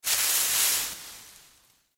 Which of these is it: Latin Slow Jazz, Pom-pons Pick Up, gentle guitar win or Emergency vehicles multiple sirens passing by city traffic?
Pom-pons Pick Up